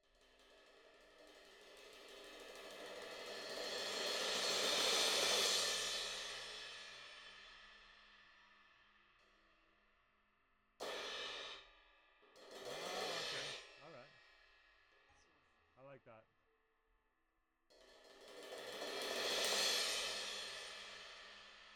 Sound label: Cymbal